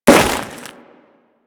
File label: Crewman Step Ice, Footsteps; Warframe; Corpus